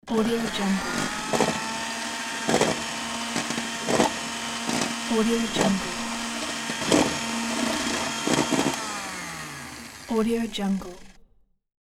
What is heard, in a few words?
mixer hand held electric; hollywoodedge